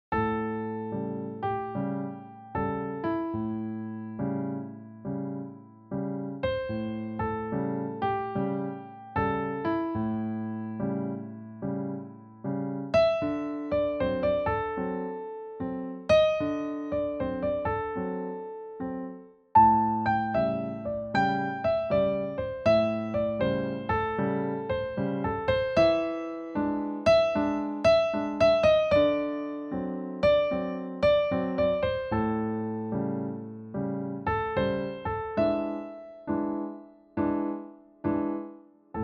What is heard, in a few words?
Blues